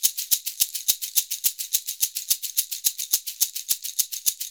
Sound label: SHK, Shaker; Univers Sons; Basicussions